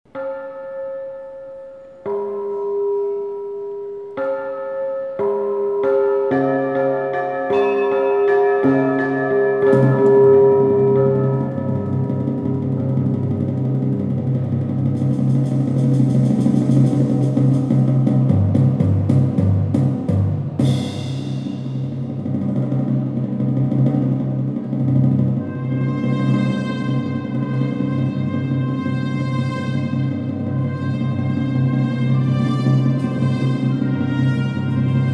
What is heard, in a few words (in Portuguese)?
Piano e Cordas